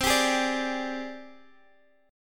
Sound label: G sharp Major Sharp 11th C